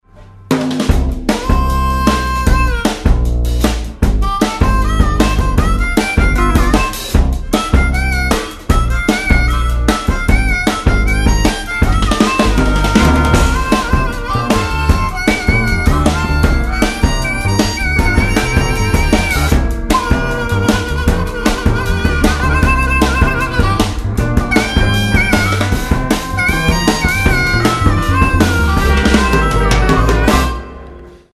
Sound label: acoustic harp